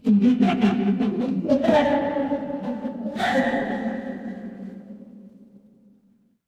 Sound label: crowd laughter